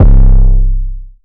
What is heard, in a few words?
808 - C; Tarnish; 808s; Cymatics; 2022 Melody Mystery Expansion